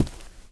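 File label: player wood scrape; svencoop; sence; mistake coop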